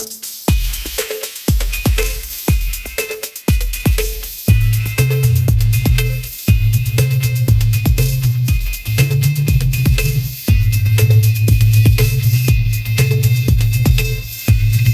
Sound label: pop groove, ringtones